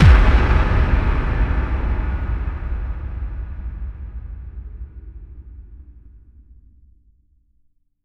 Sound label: FX Kicks